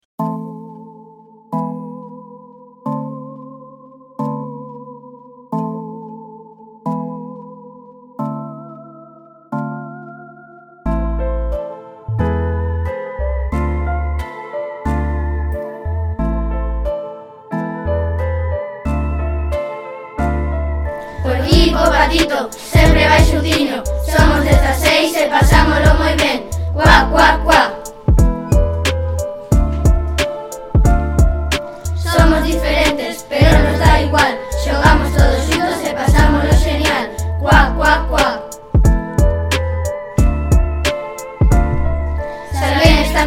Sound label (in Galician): Rap